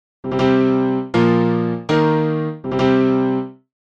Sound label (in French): accords en quinte